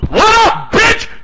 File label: wub